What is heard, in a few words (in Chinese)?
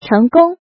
成功